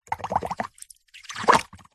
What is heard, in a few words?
leisure whoopee cushion deflate shallow water bubbles hiss short